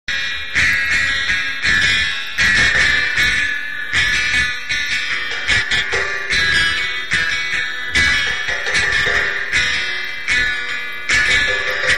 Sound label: koda; two guitars; thrash gop-rock